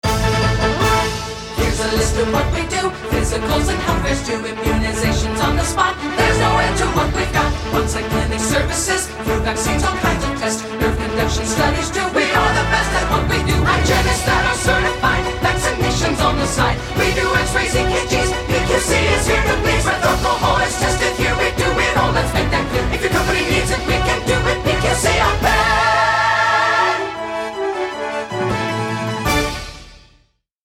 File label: jingle